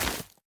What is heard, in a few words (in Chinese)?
下界苗破坏声